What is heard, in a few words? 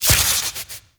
alchemist vial ability poison shatter; Warframe; Warframes; Elements